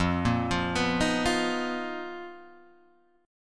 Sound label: B Major 7th Flat 5th F, chord shape